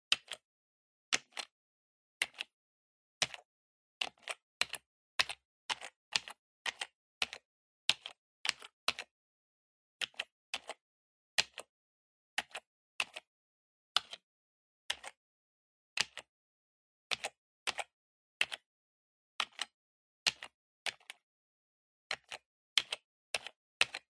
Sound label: PC Keyboard (Gentle)